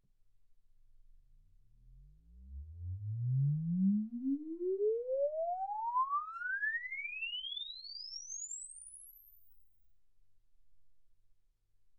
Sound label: exp swept sinus 10Hz 24kHz